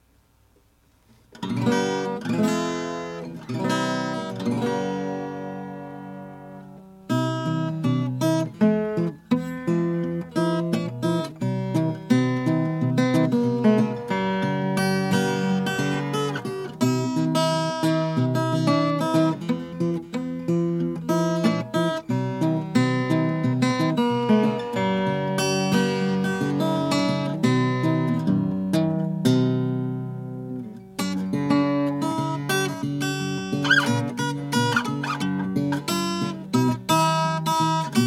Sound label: 1932 regal harmony le domino black